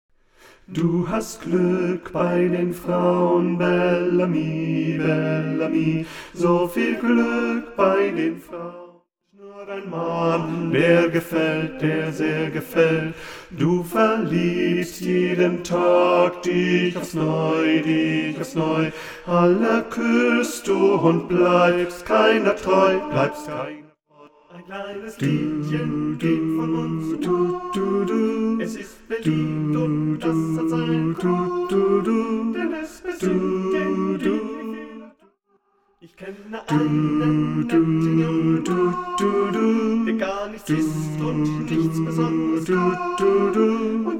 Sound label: BASS